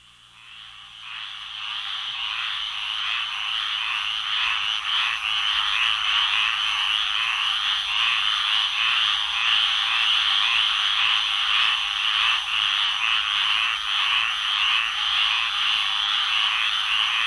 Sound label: squirrel treefrog chorus